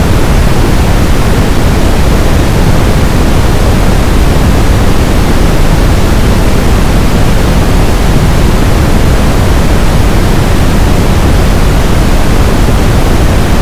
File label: brown noise; Auditory Memory